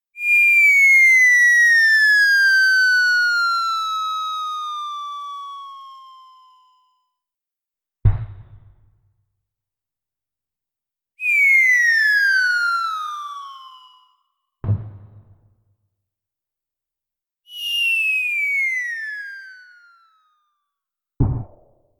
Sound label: falling whistle cartoon